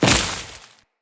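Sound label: footstep on vegetation, enemies; Footsteps; Grineer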